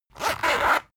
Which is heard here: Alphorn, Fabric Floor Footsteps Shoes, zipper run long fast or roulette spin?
zipper run long fast